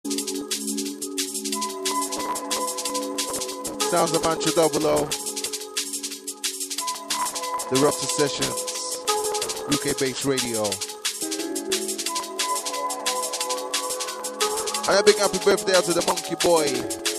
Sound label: Drum and Bass Jungle; UK Bass Radio